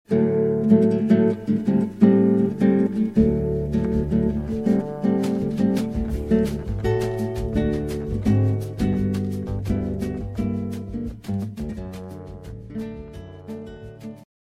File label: bgm